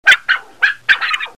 Barking; Lexi

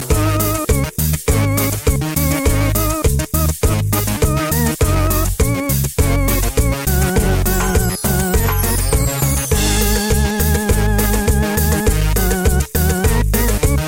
Cumbia

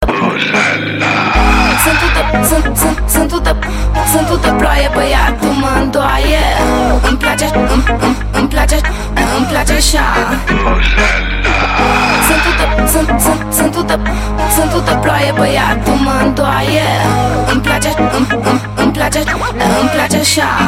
Vuvuzela Party

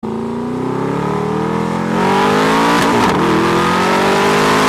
SLS AMG V8 Bi-turbo

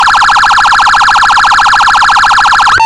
Police Phaser

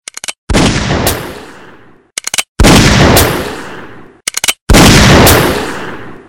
Gun Shot

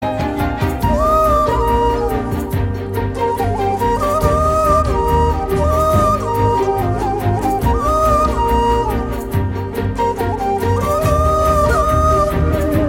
Tamil Flute Bgm

